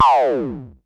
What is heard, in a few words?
Perc Synth Raw